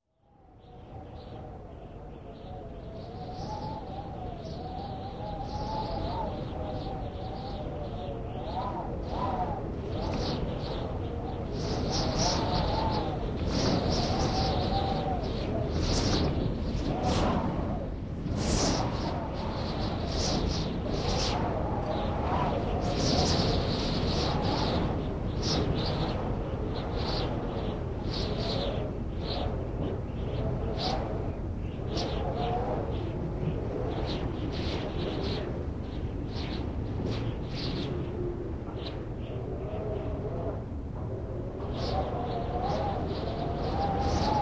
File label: Wind howling And Whistling, Weather; Sound Ideas 2000 Series